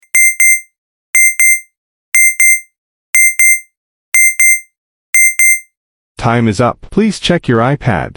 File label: time alert